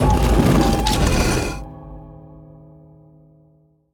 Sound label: splash Screen Continue; templeofnudges